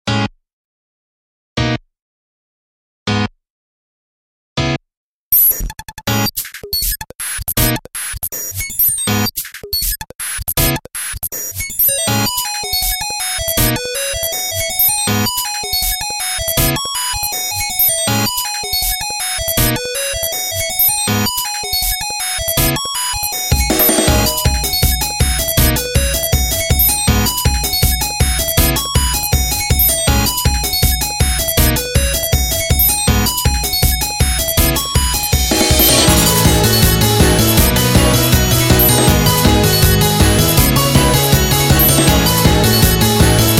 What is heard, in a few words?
instrumental